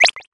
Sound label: leisure retro arcade game drop item tone